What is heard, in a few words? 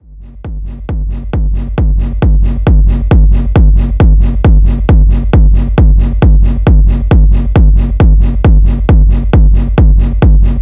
signature kicks; zumstein